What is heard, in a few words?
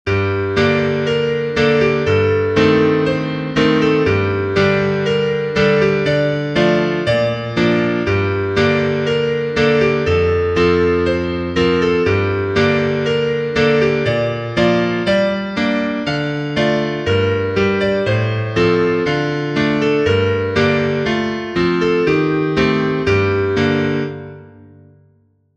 piano, sheet music